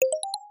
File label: notification Join